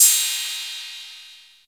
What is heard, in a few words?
Cymbals Ride; Vengeance Samples